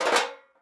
drop metal sheet; Rust

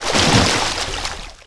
water swim

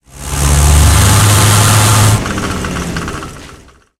m1 engine load, tanks; War Thunder

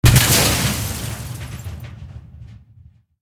projector crash; tanks; War Thunder

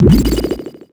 pickup collectable

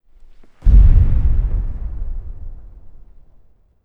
park occasional stereo

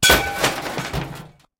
streetlight fall; tanks; War Thunder

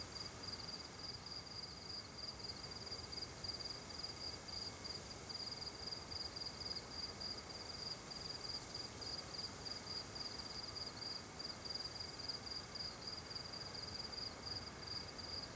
ambience forest night calm dry; Rust

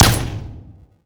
tungsten indoor

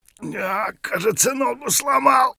bear3 broke leg; Escape from Tarkov

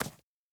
stag footstep dirt hard; Rust

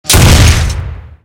tank hit aphe small; tanks; War Thunder

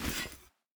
2handed sword deploy; Rust

